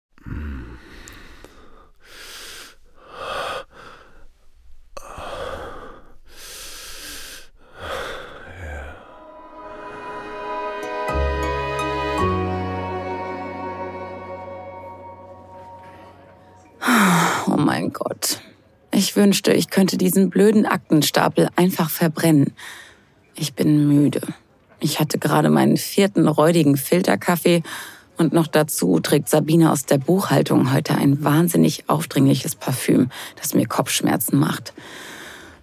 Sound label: male moaning; risikoreicher genuss spicy video am arbeitsplatz erotik geschichten